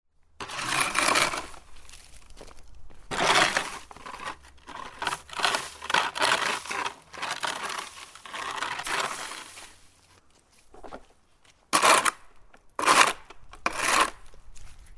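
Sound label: sounds of shovel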